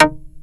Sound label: TURBO ACID